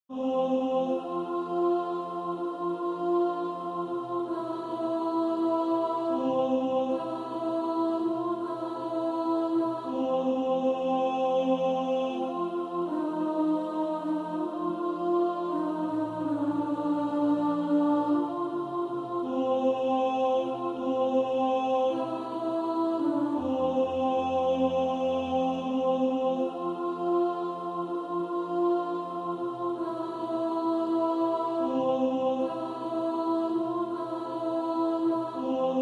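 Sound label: alto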